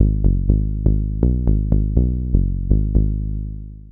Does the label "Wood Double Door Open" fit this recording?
No